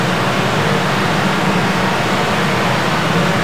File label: waterfall echo